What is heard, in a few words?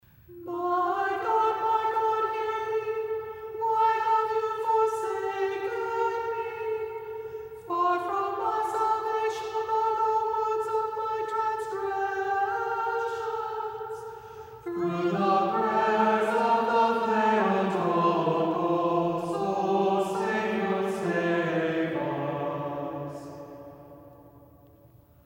First Antiphon; Exaltation Holy Cross